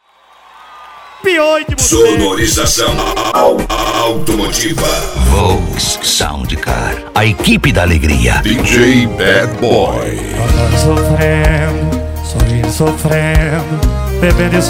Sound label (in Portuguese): sertanejo e pisadinha; cd volks sound car